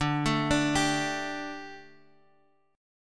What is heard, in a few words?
D Suspended 4th, Guitar; arpeggiated